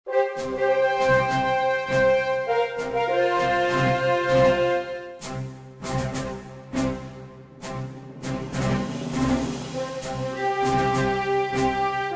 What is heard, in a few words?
Victory Fanfare; Ingame Music